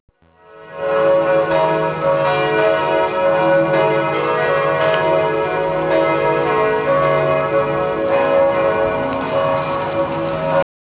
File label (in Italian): campane, s. lorenzo